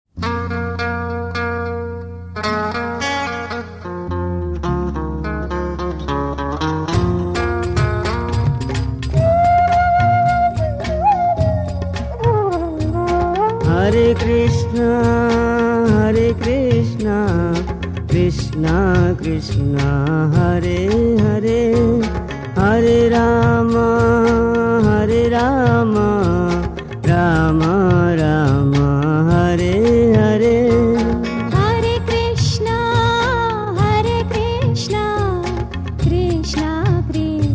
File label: Raga Malkauns